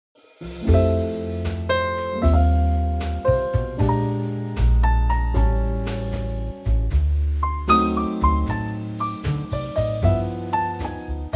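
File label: F trio, Backing Tracks for Male Vocals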